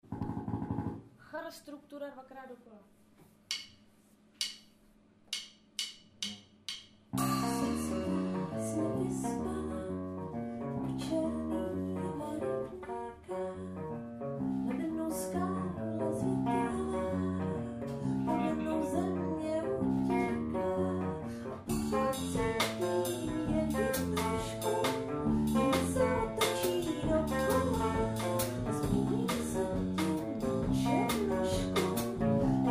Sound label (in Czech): jen bici moc jazzy; chrr kyt bas prehledne